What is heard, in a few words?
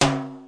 lo timbale; Western and Latin Percussion; single hits